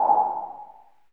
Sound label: wind